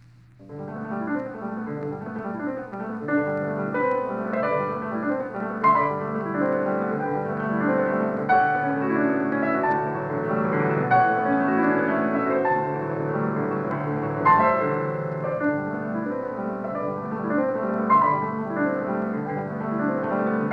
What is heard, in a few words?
Prelude in G major; Frédéric Chopin